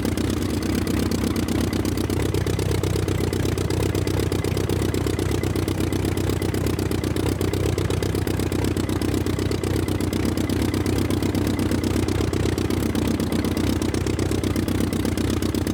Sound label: quarry engine loop; Rust